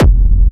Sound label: Industrial Techno Kick oneshot F, Nicely shaped, crispy and reverbed industrial techno kick; hard techno, peak time techno